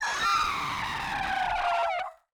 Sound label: wyvern death